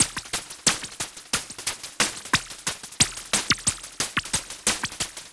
groove; PLUTONIUM; FRENETIC FRACTAL GROOVES

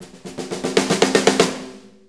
6-8 Fill, Drum Tools

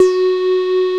CS-15; Masterbits; Vintage Voltage; LEADS

